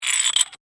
Clamp Slide Open, Industry, Clamp, Slide, Open, Metal; Factories (Sound Effects:Backgrounds)